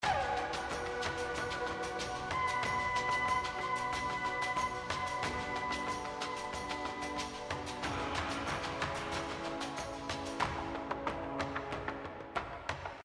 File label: BGM Bonus; Wildlife Adventure